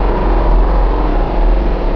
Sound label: garage tone, ambient atmosphere; Source Engine; cstrike